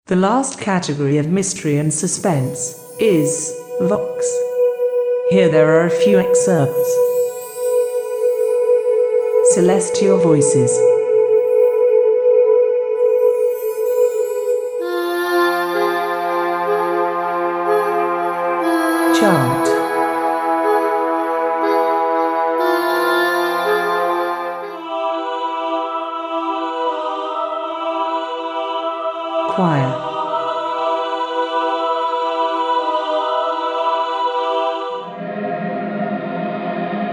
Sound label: mystery suspense vox; luca visual fx